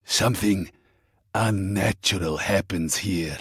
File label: intro outro; Lbpvita